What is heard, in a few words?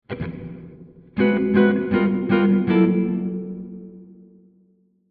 Guitar Chords Extra Boing; U-He Twangstroem